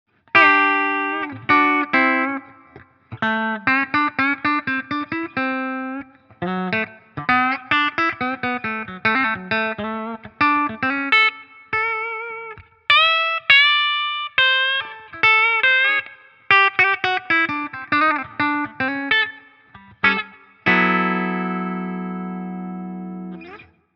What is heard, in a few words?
FGN Neo Classic LS 30 Steg clean